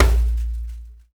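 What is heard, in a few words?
HAND DRM, hand drum; Spectrasonics; Heart of Africa 1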